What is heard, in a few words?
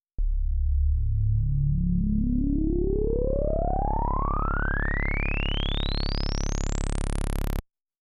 Ladder Reso; synthmaster